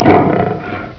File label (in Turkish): arslan kükreme, hayvanlar